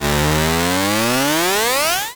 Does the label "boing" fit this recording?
Yes